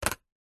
money coins x2 australian 2 dollar coins set down on plastic lid; foley; zapsplat